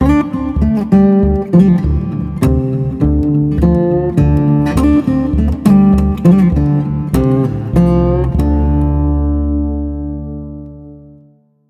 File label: Mission Music Light Stinger; starcraft 2 wings of liberty